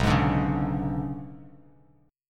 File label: B Minor 6th Add 9th B down Bass Standard, Bm6add9 chord; Bm6add9 strummed; JGuitar